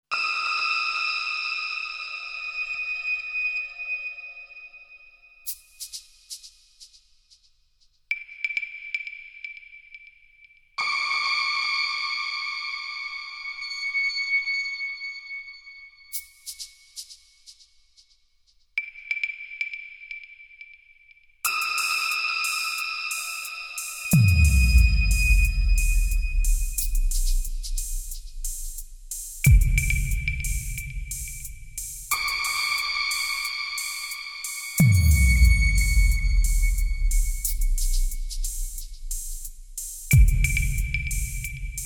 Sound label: Suspense